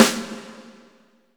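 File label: snare drum, real mega drums; Best Service; Real Mega Drums VOL-1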